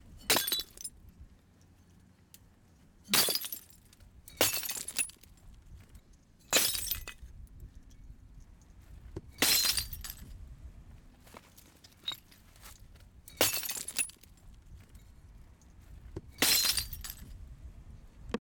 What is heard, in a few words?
Breaking Glass Bottles